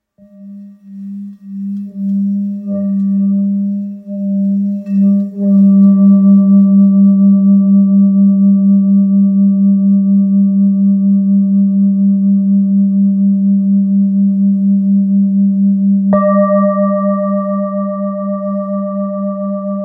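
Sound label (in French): Bol full moon rose sol, bol chantant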